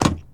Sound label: Truck Expedition Door Close; Trucks